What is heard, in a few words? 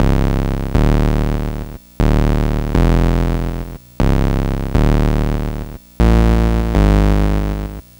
Atari 2600 Bass, chiptune